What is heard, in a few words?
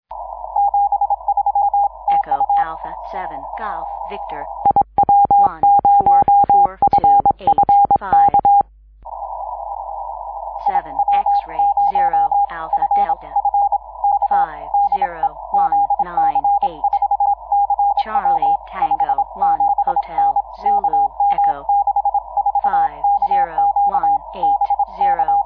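Morse code, 6m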